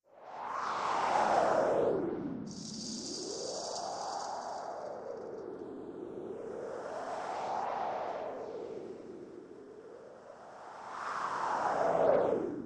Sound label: wind